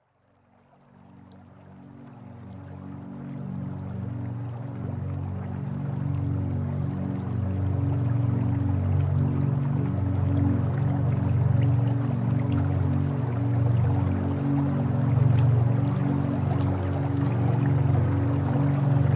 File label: Delta Waves Zen Sleep Music